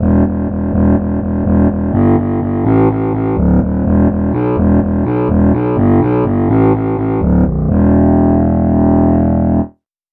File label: alto sax bass